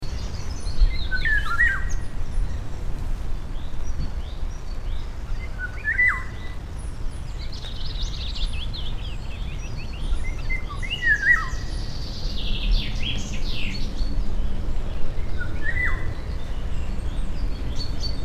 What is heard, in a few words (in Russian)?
zvuk penija ivolgi